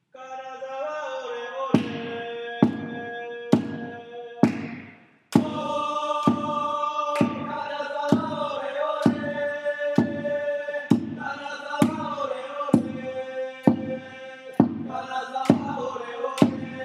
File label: maraca